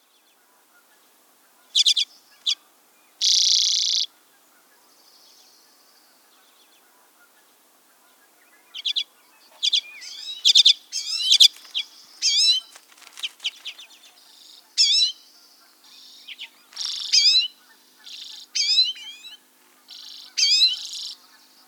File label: Common Redpoll Song and calls